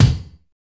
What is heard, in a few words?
DANCE SET, DRUMS